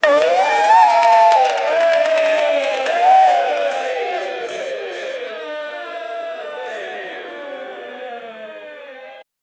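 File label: audience at game show cheering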